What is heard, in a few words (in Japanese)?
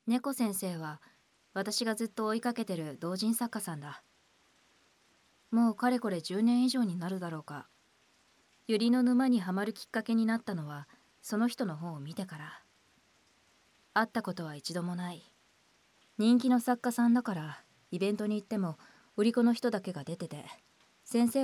ほろ酔いの雨と耳かき BGMなし